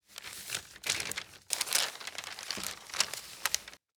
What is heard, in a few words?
magazine pages flip